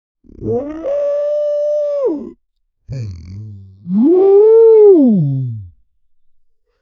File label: aquatic animal reptile am